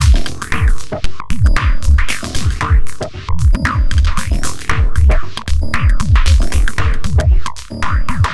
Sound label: 115 Bpm Stab Loop